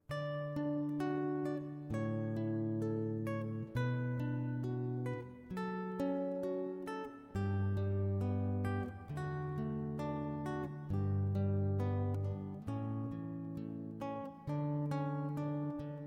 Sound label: cannon in d